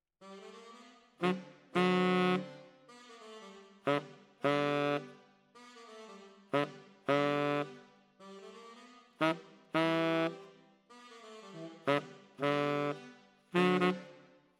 Tenor, RNR for piano

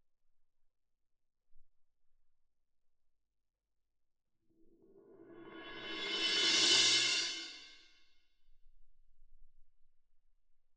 Cymb Main

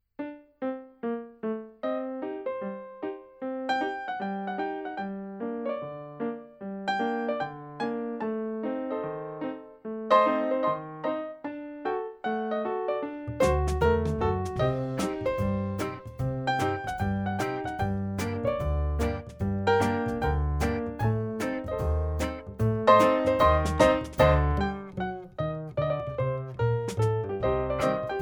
RAGTIME Ragtime BPM75 All

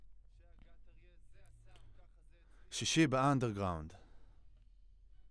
Voc OD

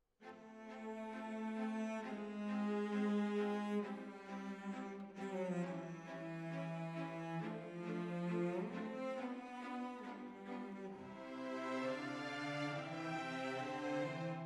Decca, Jazz for piano

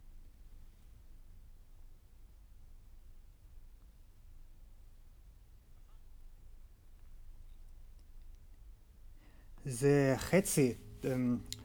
Tape GTR

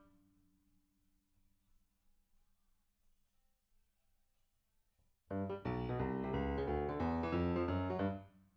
PIANO, RNR for piano

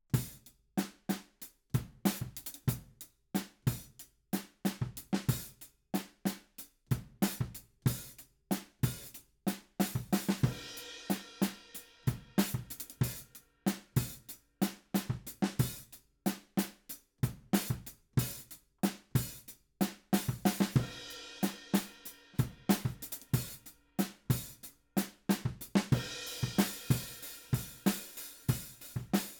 Ride

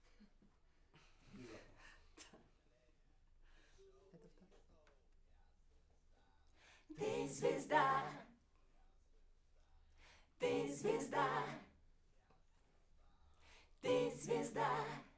Backs; SCHOEPS

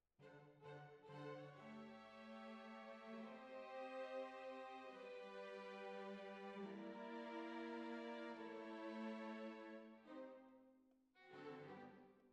Flute

